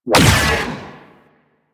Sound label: Mega Beam Fire; Warframe; Venus; Venus Flying Spaceman